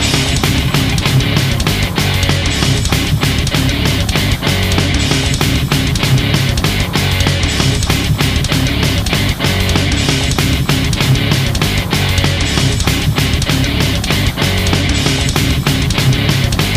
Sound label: Battle